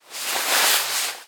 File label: moving under the covers